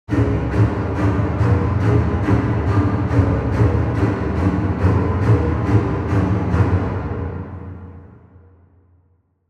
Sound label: Intense Strings Low, Horror Strings